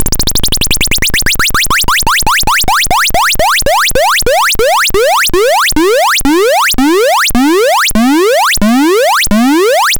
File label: Apple II FX Invader Touchdown, Chiptune